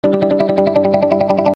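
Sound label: Delay Guitar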